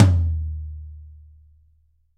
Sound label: roomy tom drum f key; tom drum samples; one shots